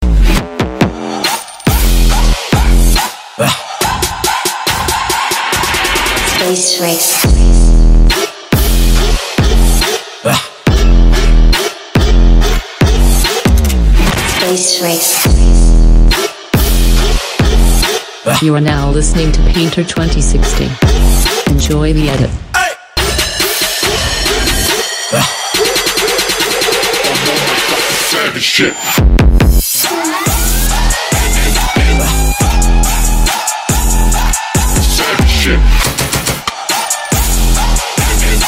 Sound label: Music Bass